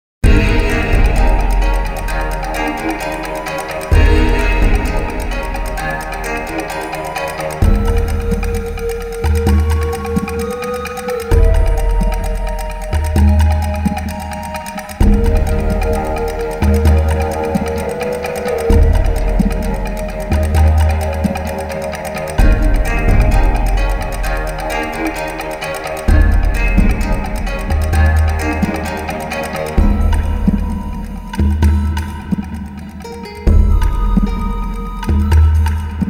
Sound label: fast, music; Soldier Of Fortune II Double Helix; XBOX; hongkong